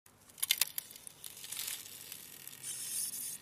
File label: meth frezzing